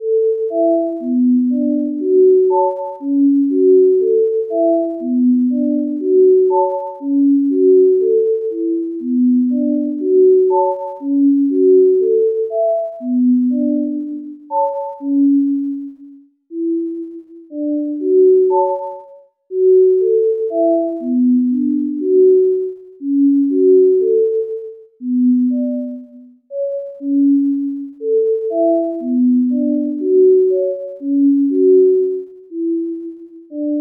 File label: Orchestra; Holographic; superlearning